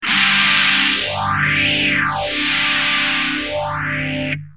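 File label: guitar, Nady phaser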